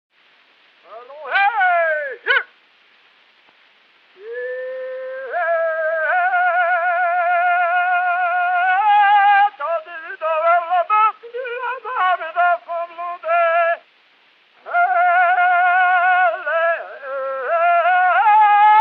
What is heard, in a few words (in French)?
Briolee aux boeufs, chant; Ferdinand Brunot collecteur; 56 ans cultivateur